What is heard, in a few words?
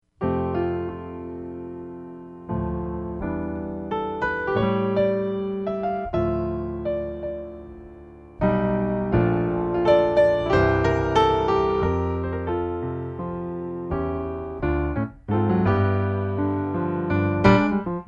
solo